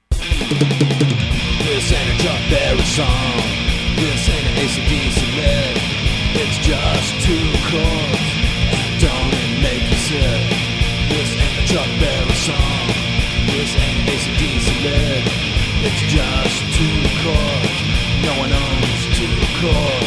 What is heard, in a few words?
punk rock drum machine